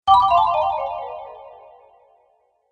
Magical Cartoon Spell Falling, Magic Sound Effects